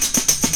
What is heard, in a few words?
3 BEAT RHYTHM